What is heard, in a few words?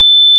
ORG, Farfisa